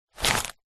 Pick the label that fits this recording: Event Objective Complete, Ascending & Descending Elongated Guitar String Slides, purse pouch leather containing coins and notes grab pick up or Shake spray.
purse pouch leather containing coins and notes grab pick up